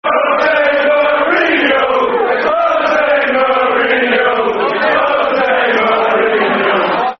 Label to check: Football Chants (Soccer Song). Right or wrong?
Right